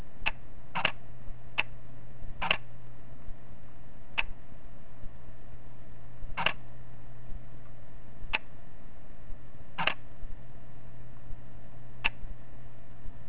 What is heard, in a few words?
quiet mode; Canon EOS-7D